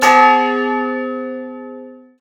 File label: Old Clock